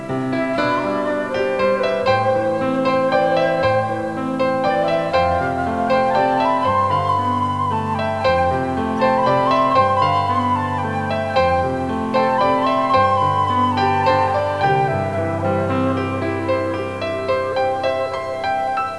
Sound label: background music